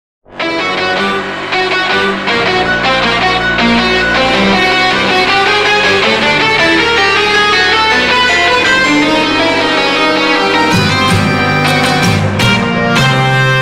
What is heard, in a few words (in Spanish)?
Guitara electrico